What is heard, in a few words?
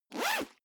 zipper backpack open fast